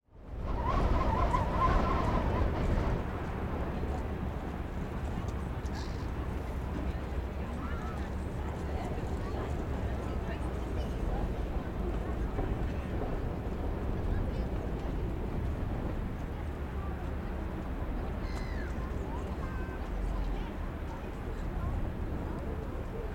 seafront with wind blowing through flags and dogs barking; saltburn